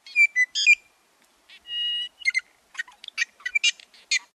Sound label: Iiwi Call